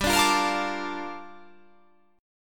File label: G Minor 9th